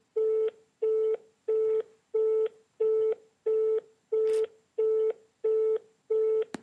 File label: skype busy signal